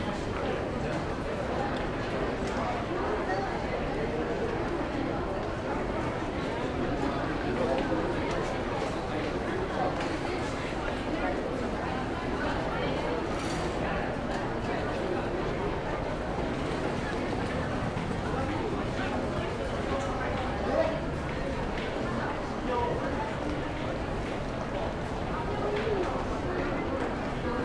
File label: Urban people babble crowded; urban and suburban; boom library